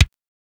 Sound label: Kick, Fairlight CMI III